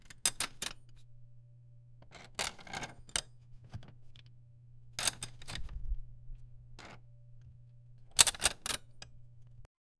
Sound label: mechanical parts unraveli, loose